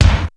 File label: BD GATE